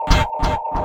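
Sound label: noise; Sonic Foundry (Sony Creative Software); Esoterik Beatz; Saver FX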